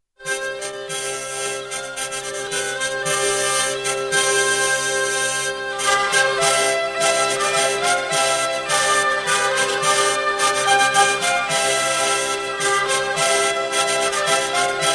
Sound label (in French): Bourguignonne - France - Bourgogne